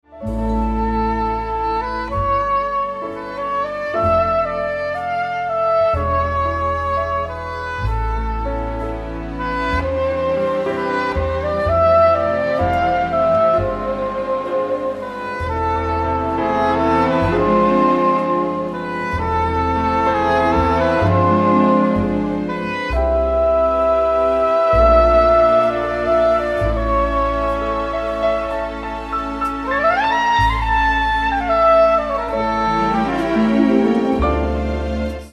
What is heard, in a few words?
Soprano Sax